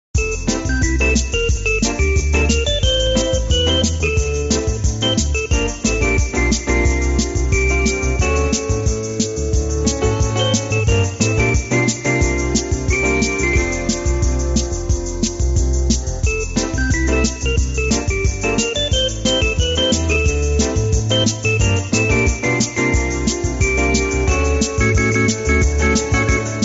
music tv show intro

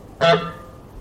honk lid

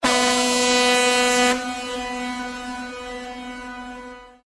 horn

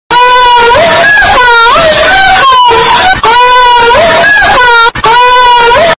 aaaaaaaaahhhhhhhhhhhh

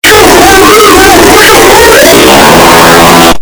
brain fart loud

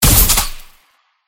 dart trap shot

